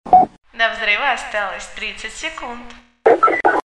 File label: c4 timer; cstrike; c4 control